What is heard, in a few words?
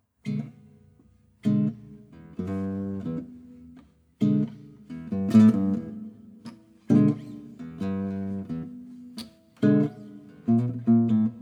blues